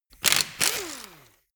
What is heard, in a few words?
impact wrench screw fast single short